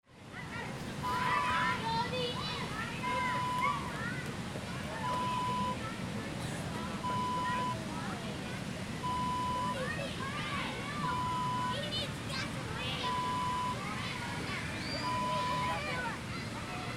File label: kids playground ambience